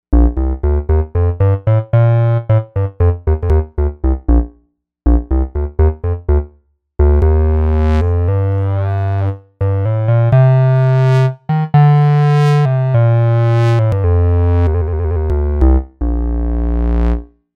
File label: BassClarinet, Novation UltraNova; Factory Patches